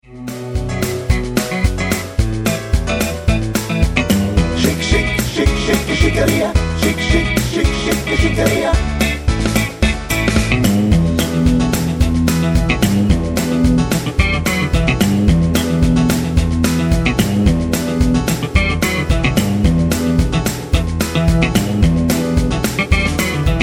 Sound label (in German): mit Chor